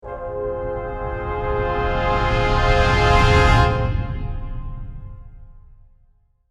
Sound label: Dramatic orchestral hit with percussion and brass